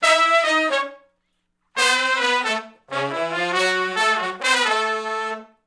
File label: R&B Riff (F#) unison, Horncraft; Sony Creative Software; Crimson Blue and Fabulous Horncraft 4 RnB; Horncraft for R&B; Sections